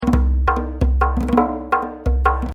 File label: 6 Beat 3-8 96